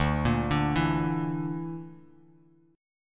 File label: D Suspended 2nd Sharp 5th D, Bass Standard; Dsus2#5; arpeggiated; JGuitar